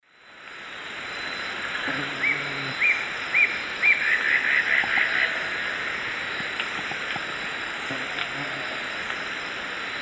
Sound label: Megastictus margaritatus, song; S. America; Eastern Lowlands